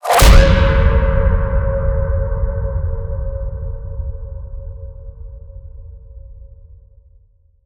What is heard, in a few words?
impact grit; Virtual Riot; Impacts